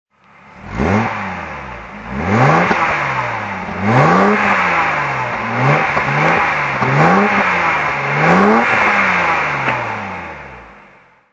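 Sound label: jaguar XJS 4L convertible throttle, Engine sound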